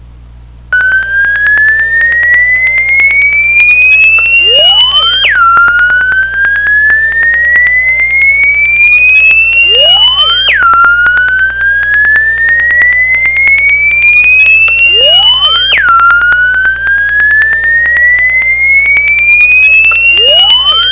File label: sweep, tones; 2600; phreaks and geeks